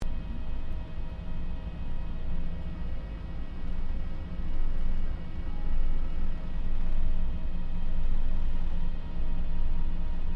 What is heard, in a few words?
AIRCRAFT LOCKHEED L-1049 SUPER CONSELLATION SHUTDOWN INTERIOR; Connie, Super Constellation; 1955; Airplanes